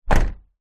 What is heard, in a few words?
vehicles truck small door close medium